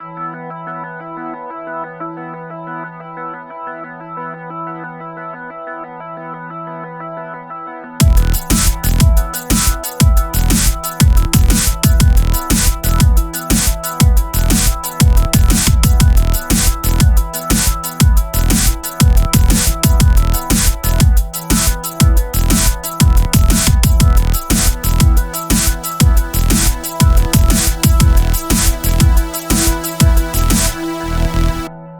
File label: distorted bass stuff